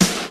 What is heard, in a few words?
sparkly acoustic snare f sharp key; Mono